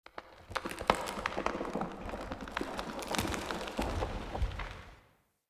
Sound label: Wake Up Loop Root Creak; Cinematics; Bromius; Bromius Quest Wake Up Loop